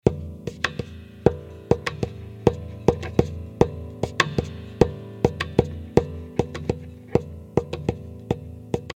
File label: ibanez jgm10